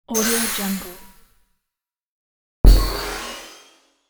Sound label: gas release game item